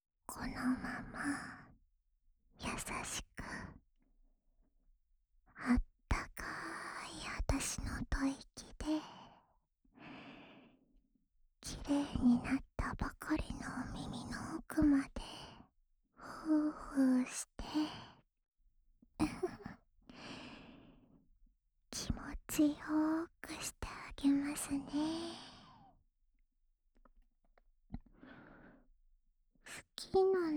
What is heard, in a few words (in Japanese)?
左耳ふーふー, ASMR; お兄さんの理性、くすぐりまくっちゃう♪